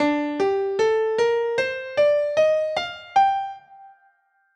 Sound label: hankdrum aeolian tuning